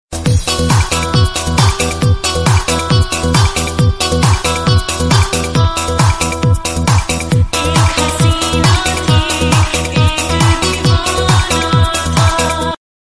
theme female ringtone; karz

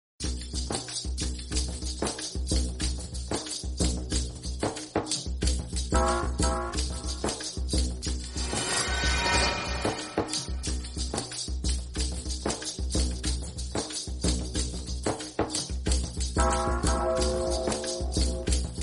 african tribal music ringtone

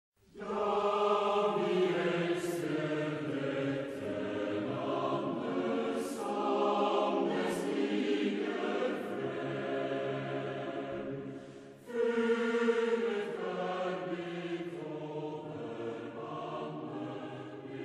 norway anthem

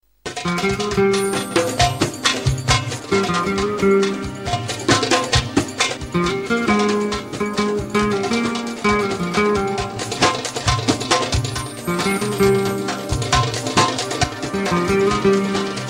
arabic mix

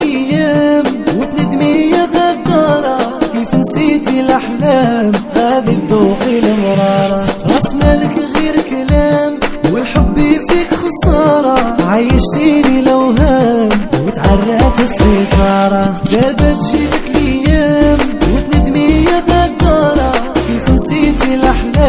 moroccan ringtone

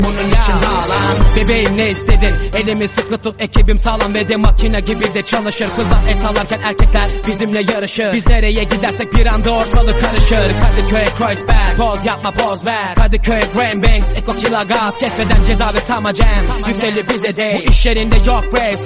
turkish rap

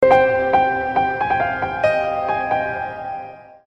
piano ringtone

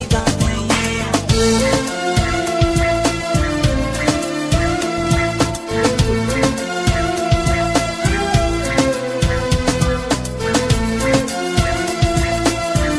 instrumental music ringtone

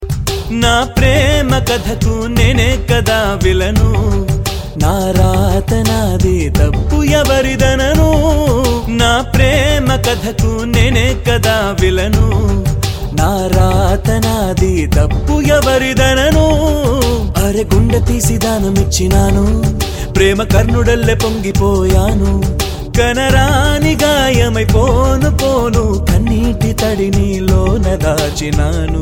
tamil solo

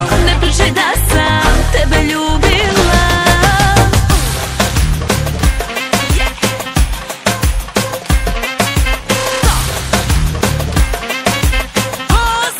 nice croatian ringtone

